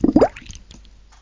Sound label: Bubble